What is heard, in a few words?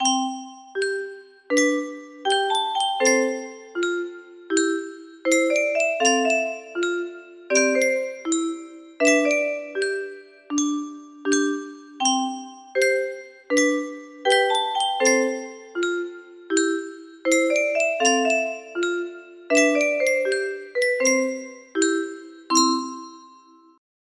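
music box melody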